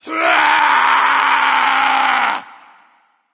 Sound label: battle cry; smite; voicepack